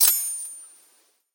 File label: ping